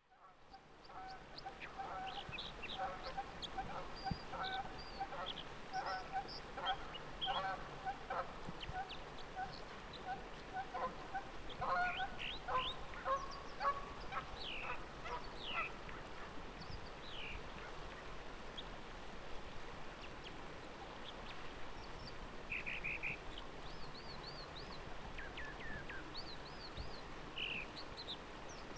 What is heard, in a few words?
Dipper and Geese Firehole River